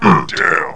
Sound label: fall; quake2; brawn